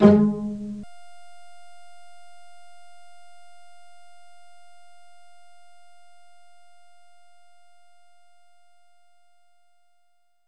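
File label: Pizzicato Strings G#3; knights try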